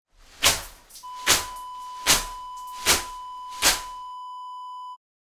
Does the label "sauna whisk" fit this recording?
Yes